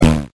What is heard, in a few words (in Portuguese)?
PEIDO